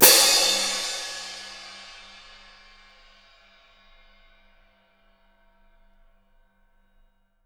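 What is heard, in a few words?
cymbal splash, left; Miroslav Vitous - Percussion & Harp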